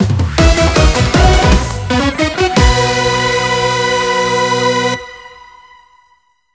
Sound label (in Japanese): 終了ジングル; 有利区間